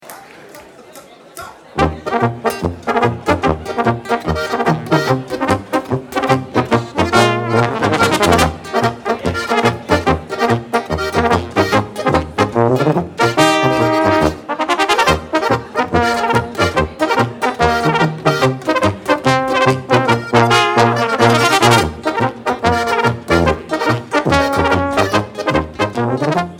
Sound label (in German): polka